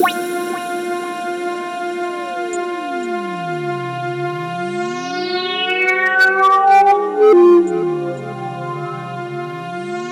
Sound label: C4, SynthLine; 90 s Sample CDs; NiceBeats; SynthLine VOL-2